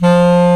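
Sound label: bass clarinet, Orchestral Winds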